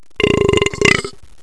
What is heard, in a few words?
slurp